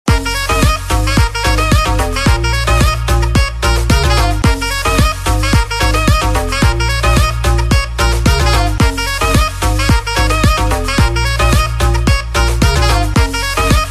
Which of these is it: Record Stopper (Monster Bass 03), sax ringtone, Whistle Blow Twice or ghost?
sax ringtone